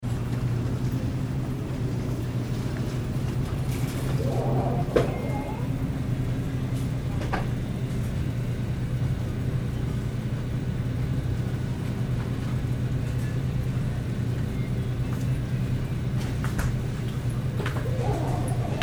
Grocery Store Background Near Frozen Foods Section Freezer Doors; LYN107 package of Urban Spaces; Stores And Mall Sound Effects